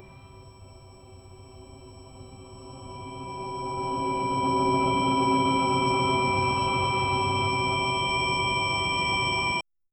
a soft metallic rotation; material

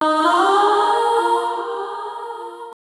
synth like vocal calm fem; vocals

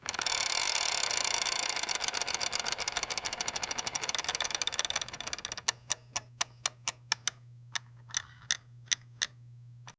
safe box dial spinning

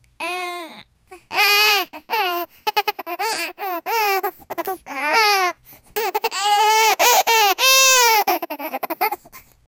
cute baby lafing

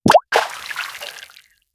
a single clear aquatic, aquatic

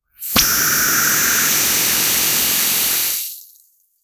realistic iphone water, air suction

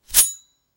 dagger slash, melee

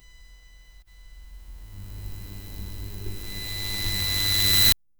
power cell pickup prompt

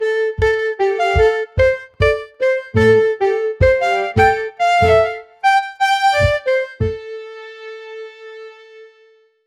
european medieval music

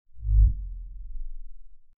a light slow woosh, wind

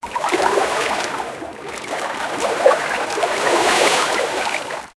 swimming in water

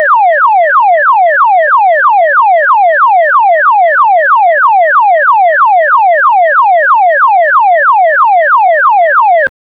police car alarm

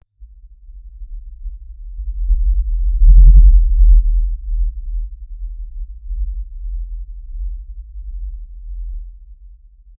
low slow repetitive thump, ship

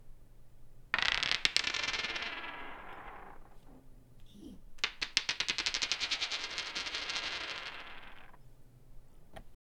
dice rolling on poll tabl

